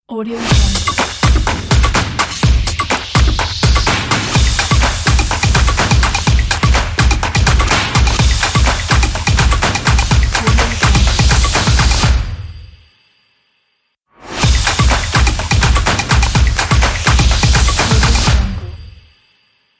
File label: logo stomps sport